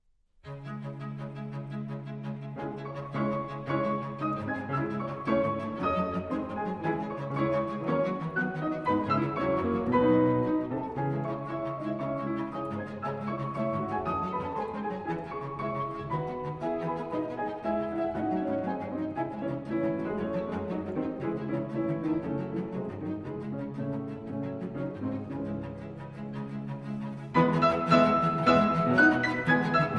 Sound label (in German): Klaviertrio f-moll, Allegro grazioso; Dvorak